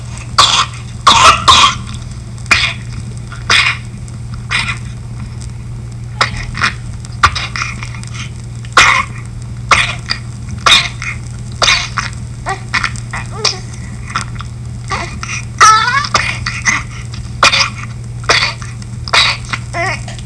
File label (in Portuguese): bronquiolite, som pediatrico; PEDIATRIA UFF